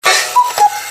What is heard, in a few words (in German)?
kuckuck